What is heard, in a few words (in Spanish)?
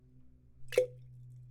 Gotas